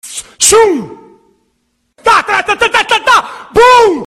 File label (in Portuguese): metralhadora; curtinhas do pastor; festa junina